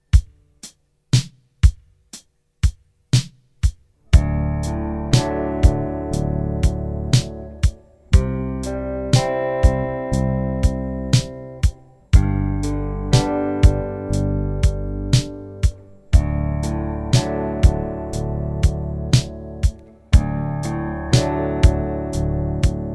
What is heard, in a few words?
D C G D Bass Bass Down